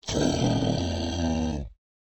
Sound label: dinosaur groan; SoundsCrate